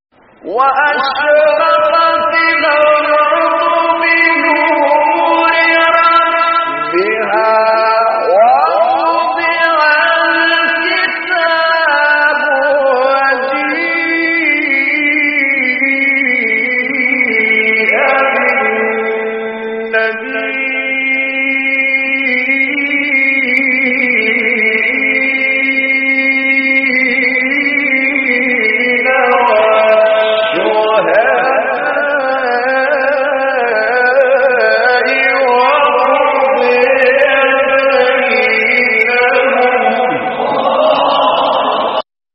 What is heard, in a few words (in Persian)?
فراز زیبا از سوره زمر; استاد طبلاوی